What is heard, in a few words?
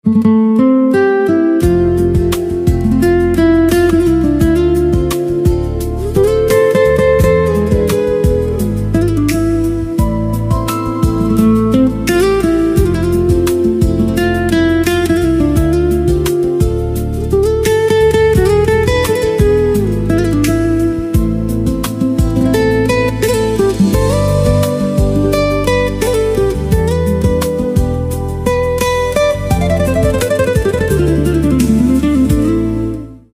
Guitar